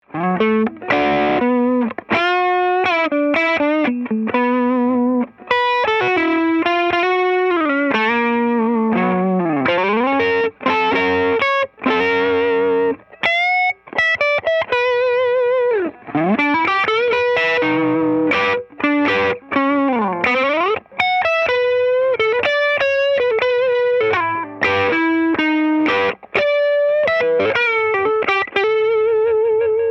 no plexi shield rock blues

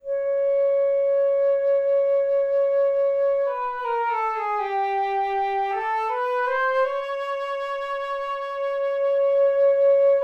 far mic

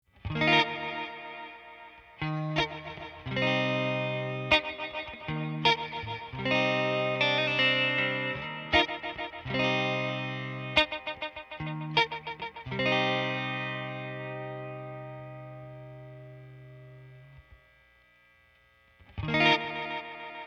Strymon Big Sky diffusion chords

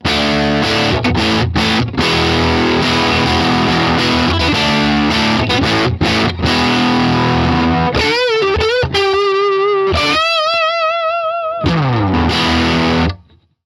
Reverent Middle Dirty

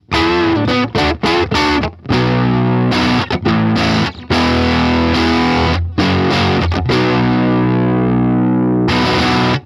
Stratocaster Middle Dirty

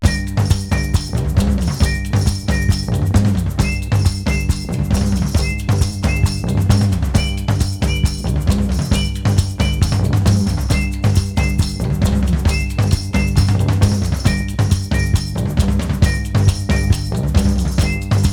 Bass Cut After

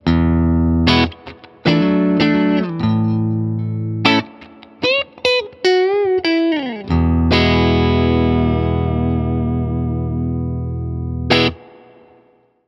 Reverend Middle Clean

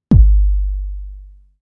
808 Layered

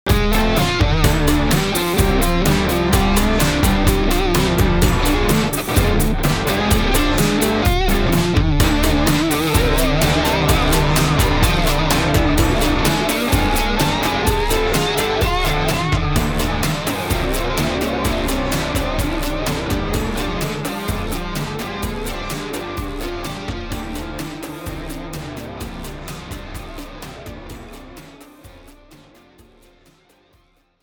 Sidechain BEFORE

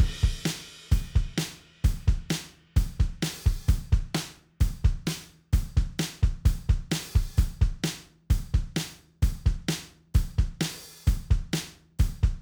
Drums

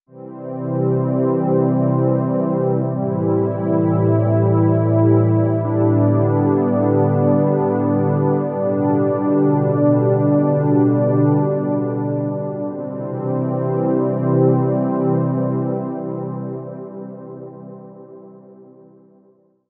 synth pad

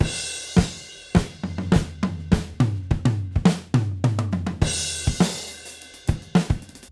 Auto-Align Toms BEFORE

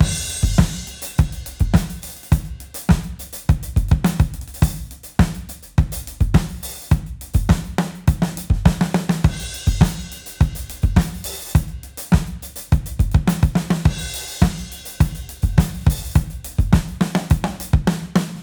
parallel kick

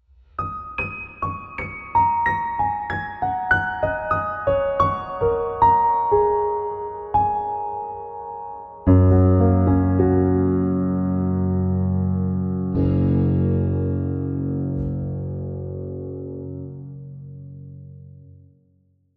piano and guitar EMT 140

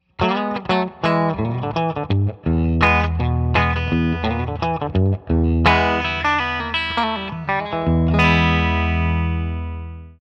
ceramic

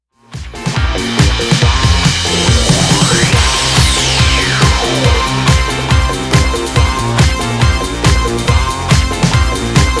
Instrumental High Pass Filtered

